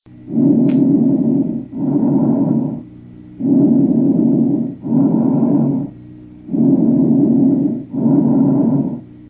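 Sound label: bronchial, crackle lung; کراکل ریه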